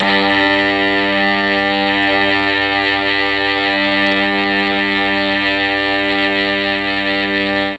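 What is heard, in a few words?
G1 string brass, mellotron; STRGS+BRASS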